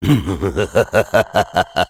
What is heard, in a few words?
Sniper laugh evil, fr